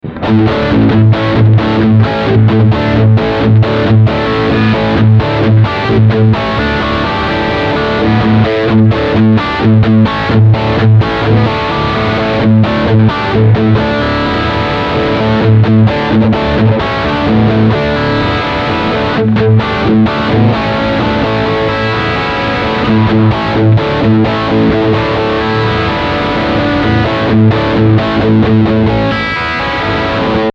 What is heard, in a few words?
Humbucker